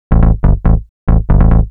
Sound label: SNTH BASS TEKNO 140 A, Techno, Bass; eJay Sound Collection 2